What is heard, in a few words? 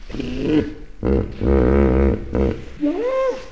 hippo; onsafari